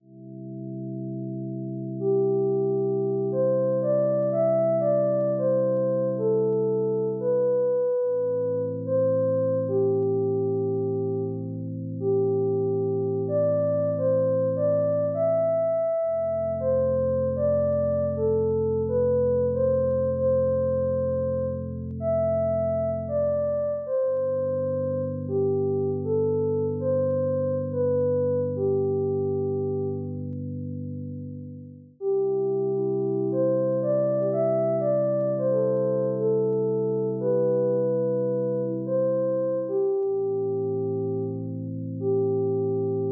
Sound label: greenhouse theme warm loop